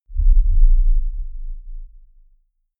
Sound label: deep sub rumble